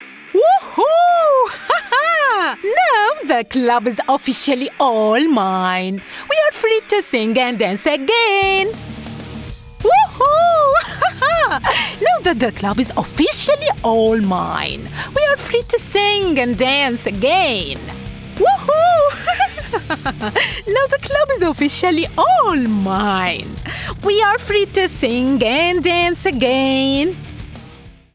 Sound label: HighMood